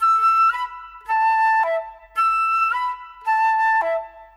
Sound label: Flute; Sonic Foundry (Sony Creative Software); Orchestral VOL-2; Modern 21